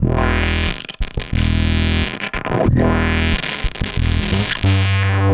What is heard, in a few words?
Distort Wah, Bass; Effected; Bass X